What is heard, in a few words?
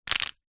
leisure puzzle pieces few down on ground; jenga; zapsplat